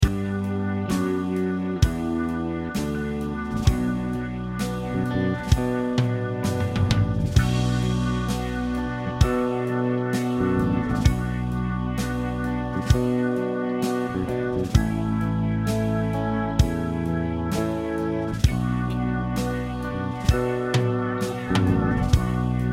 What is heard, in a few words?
backing track